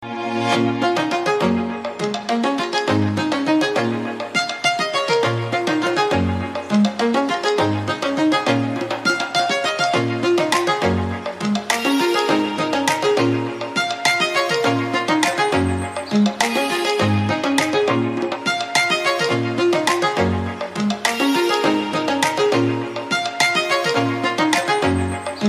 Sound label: iphone 8 ringtone